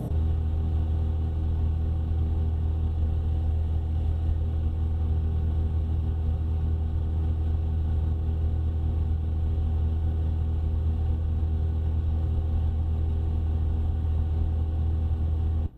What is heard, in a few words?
humming machine